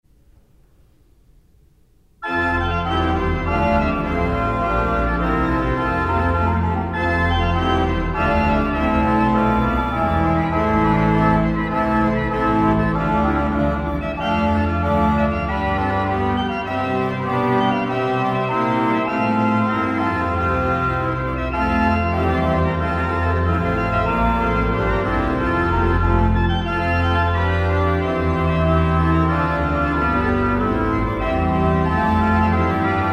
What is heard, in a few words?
Tutti